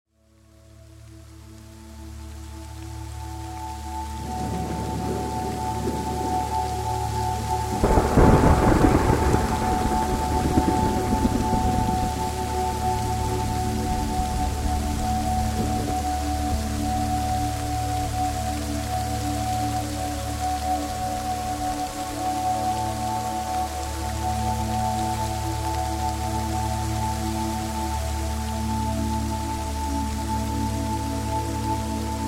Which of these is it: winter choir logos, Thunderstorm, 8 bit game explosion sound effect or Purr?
Thunderstorm